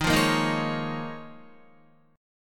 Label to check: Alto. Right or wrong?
Wrong